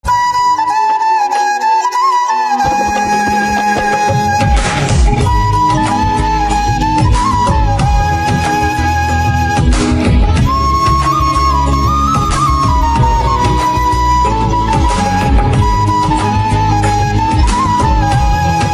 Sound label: Flute